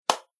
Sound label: Single Hand Clap; Body Sound Effects; Human Body